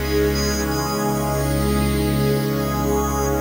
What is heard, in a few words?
Pad, Synthesizers